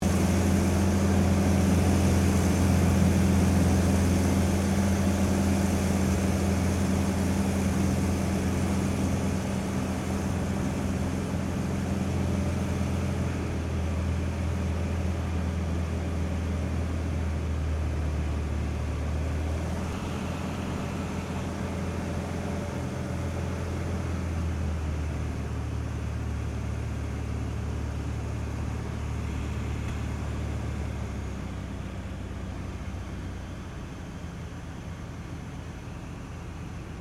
AIRCRAFT CT4A FLYING INTERIOR DECEL, RAAF CT4 training aircraft; Airplanes; Vehicles